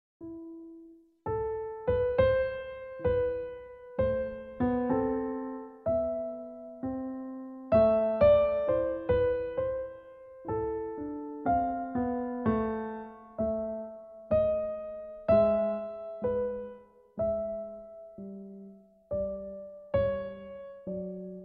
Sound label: Bach Minuet A minor Slow